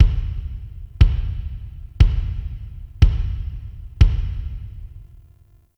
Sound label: Stadium Sound Check Drums; Ambience; Warframe; NineteenNinetyNine